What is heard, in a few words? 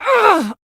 urgh; ningguang